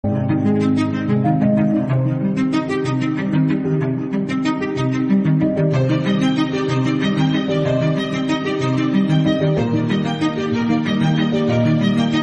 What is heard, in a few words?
instrumental music